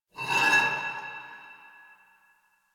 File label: Pipe Cement Scrape; Ambience; Warframe; NineteenNinetyNine